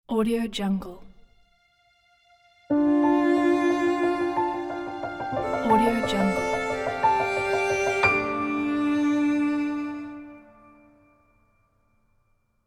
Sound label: sad logo